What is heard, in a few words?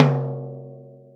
gretsch tom, deep house single hits; Zero-G Deep House